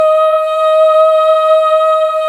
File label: Female Ooz; 90s Sample CDs; Club-50 - Foundations Roland